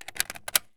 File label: sks mag in; Escape from Tarkov